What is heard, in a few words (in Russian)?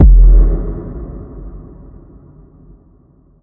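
жуткий звук внезапности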